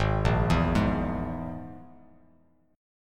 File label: Ab Suspended 2nd Sharp 5th, Bass Standard; arpeggiated; JGuitar